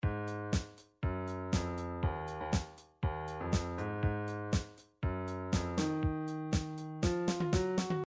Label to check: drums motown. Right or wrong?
Right